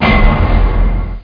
metal

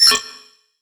ALERT; Earth and Beyond; EA Games

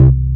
noise

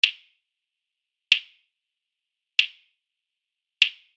Kick-click; efekt

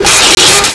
Message

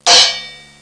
cymbal

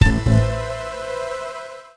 sting; Into That Dark Night; YadVashem

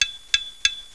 tink; duke nukem total meltdown

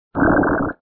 girgle; water

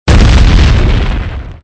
Explode Large; plane arcade

